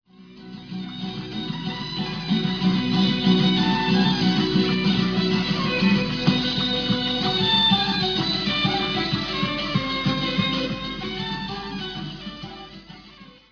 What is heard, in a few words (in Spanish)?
violin; tradiberia